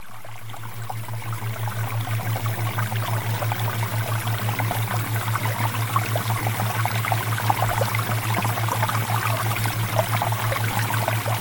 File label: Water Theta Waves